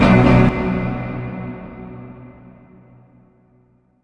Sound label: low strings